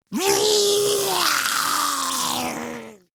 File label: Attack Roar Grineer Ghoul; Grineer Ghoul Expired; Battle Barks